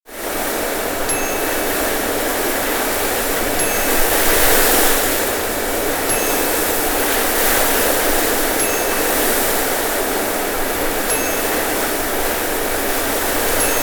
Hurricane Tornado Or Tropical Storm

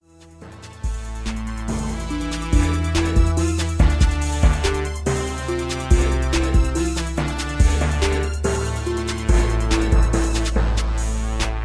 71BPM

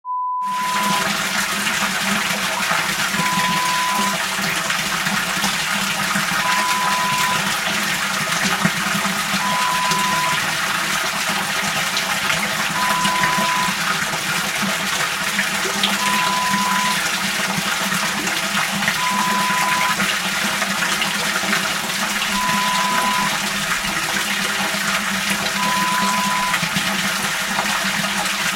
Drain sound

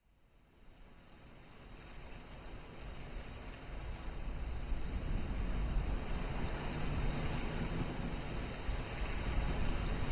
hurricane WITH THETA WAVES; relax

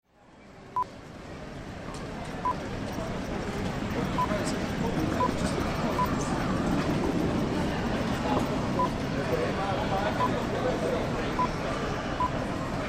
Heathrow Airport Terminal 3 Checkin

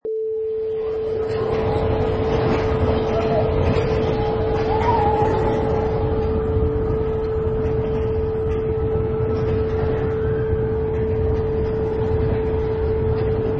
Roller Coaster

